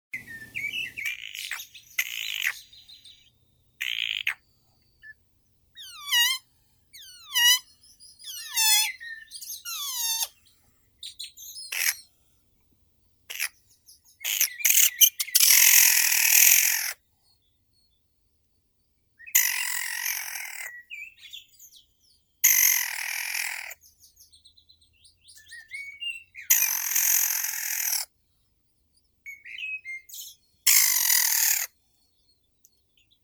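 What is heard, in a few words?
nutcracker, Nucifraga caryocatactes; kedrovka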